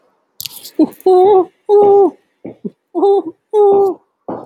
Animal Wild animals Bird Owl Hoot, vocal imitation